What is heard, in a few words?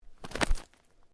Clothing Jacket Sleeve Flap